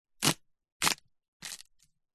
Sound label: wood split crack break splinter; impacts